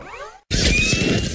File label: Repulsor Blast